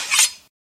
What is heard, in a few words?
sabre hide; Items equip; OldThink